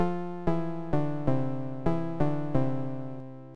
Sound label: Game Over Sound